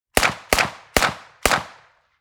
mini uzi 9mm left off axis clean four shots; 1m; mkh416; sonniss gdc

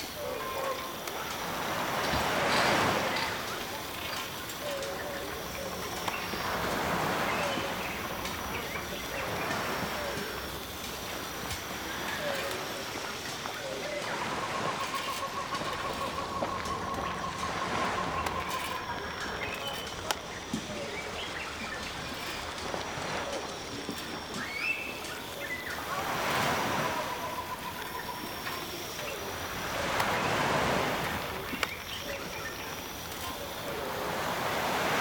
island village loop, ambiences; Michael Ghelfi; RPG Ambiences Vol. 7 (Short loops)

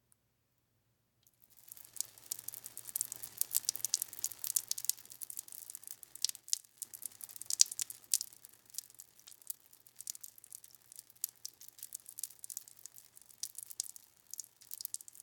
pee; sonniss gdc

